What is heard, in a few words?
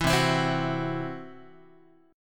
C Major Flat 5th Guitar; strummed; CMb5; 7,8,7,10,10,x